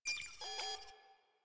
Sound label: Messenger Map Hover; Ambience; Warframe; Nineteen Ninety Nine